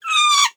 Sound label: Monkey Death